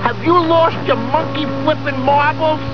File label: Monkey Flippin Marbles